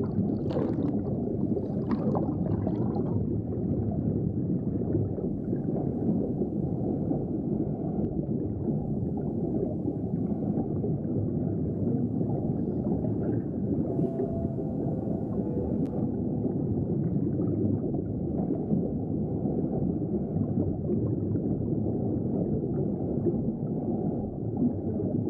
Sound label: underwater, ambience